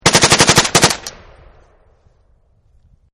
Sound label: AK47 burst, AK47 Assault Rifle Burst; War; VRSonic